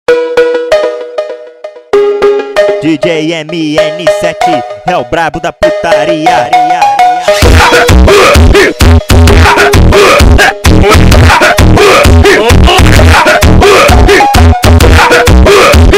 cowbell x beat

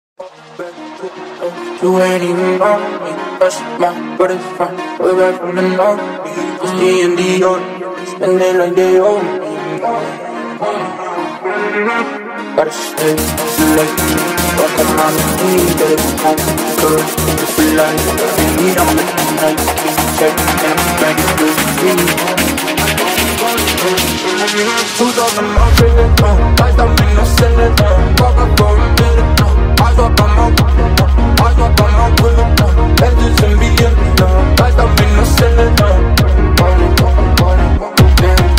techno remix

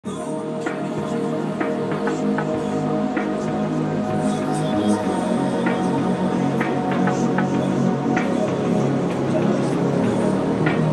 wave phonk

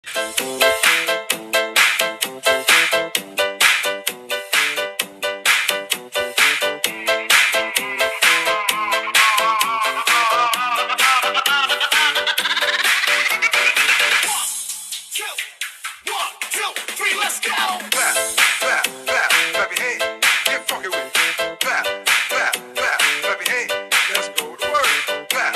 dance song

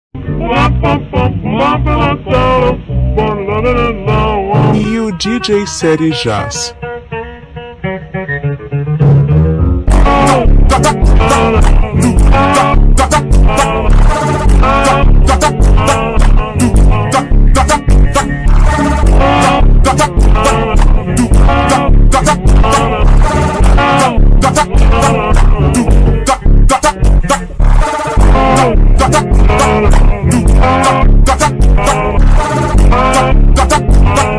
funk super slowed

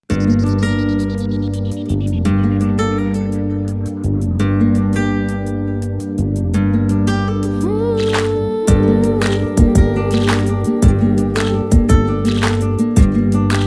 guitar cinematic

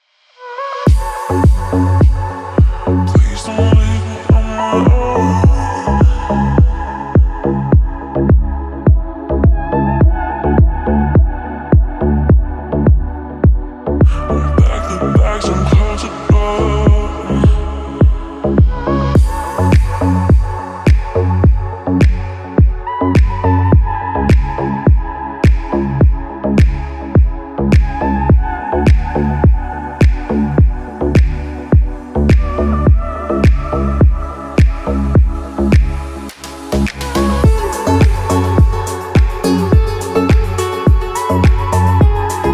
deep house

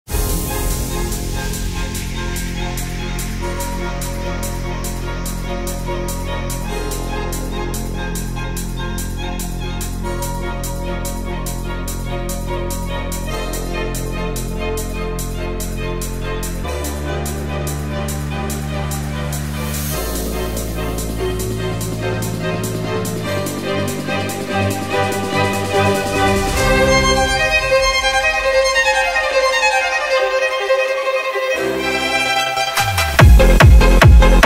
techno mix

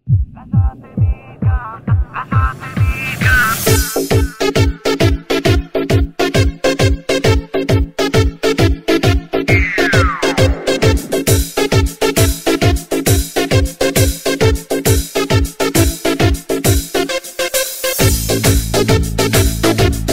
techno remix

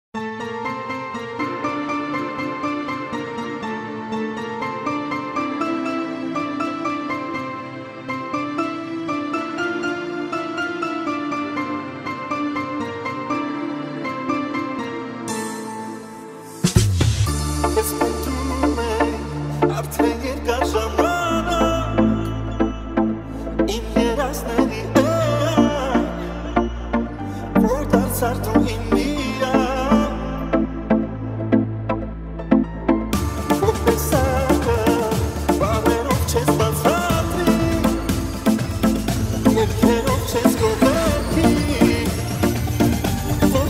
deep house

